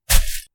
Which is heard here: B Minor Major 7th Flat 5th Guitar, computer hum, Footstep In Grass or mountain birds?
Footstep In Grass